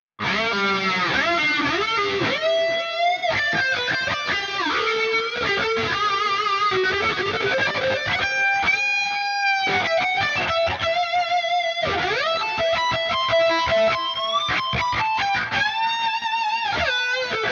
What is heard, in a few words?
Stereo Lead; Archetype Petrucci